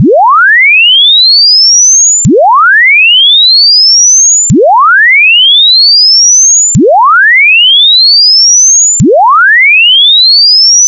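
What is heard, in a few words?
linear chirp, sonar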